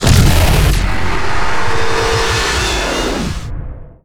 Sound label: Death, Real Sonar; Barotrauma; cortizide